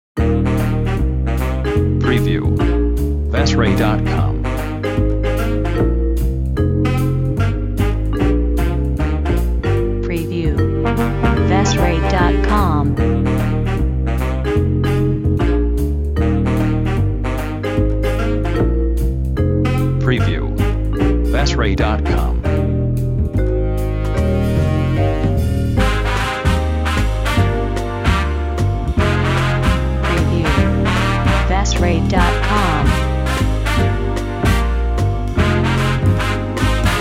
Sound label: Festive Jazz